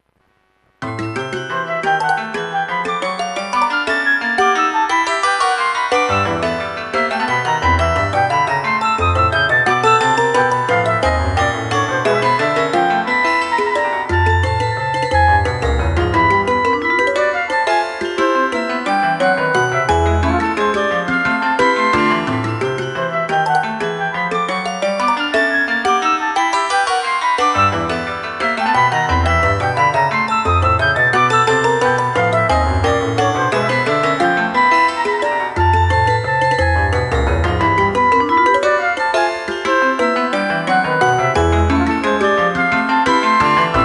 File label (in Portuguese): giga para flauta e 4 instrumentos